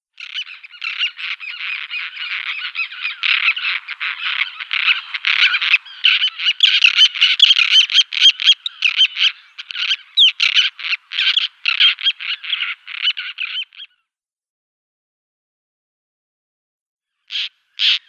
Roseate Tern

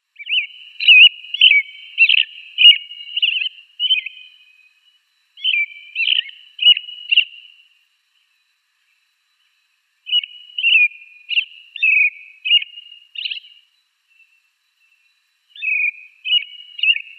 American Robin song

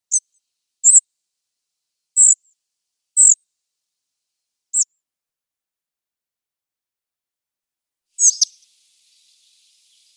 Spotted Flycatcher calls

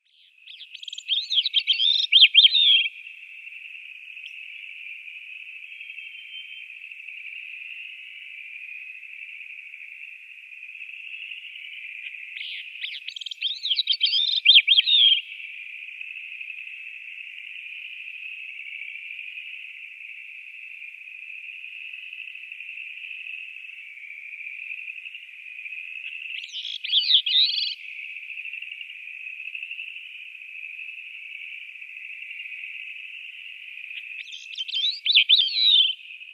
Grey-cheeked Thrush song

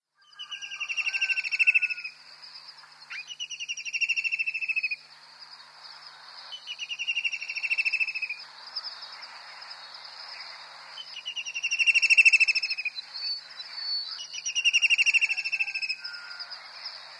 White-throated Kingfisher display

